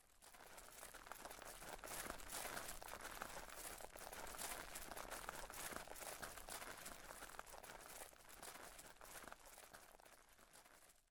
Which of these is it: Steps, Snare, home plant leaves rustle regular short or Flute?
Steps